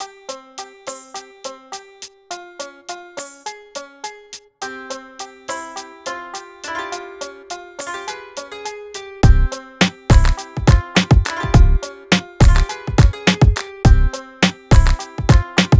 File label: 104 Cmin, Hip Hop Exotica